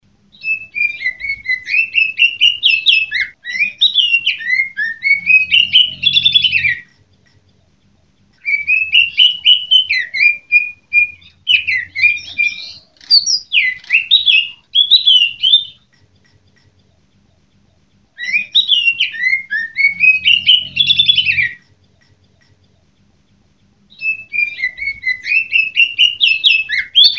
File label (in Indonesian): cucakijo betina; masteran